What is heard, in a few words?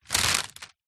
plastic bag carrier thick empty gentle handling movement; zapsplat